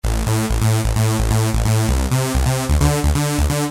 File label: loop